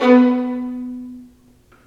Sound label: STR VN, Roland, STR Vls Marc; L-CD702; Vlns Marc1-3